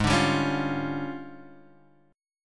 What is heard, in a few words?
D Minor Major 7th Double Flat 5th, G sharp